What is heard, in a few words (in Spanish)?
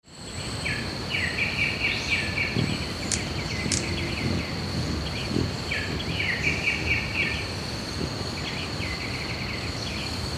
Picaflor Vientre Blanco, Amazilia Chionogaster, White-bellied Hummingbird; cantos